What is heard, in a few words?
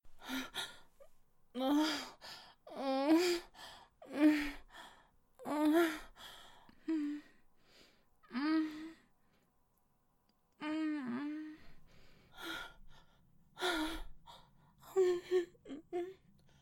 moans; lupine